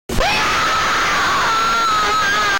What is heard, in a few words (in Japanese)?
himei